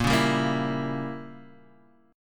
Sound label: A sharp Diminished 7th, Guitar Half Step Down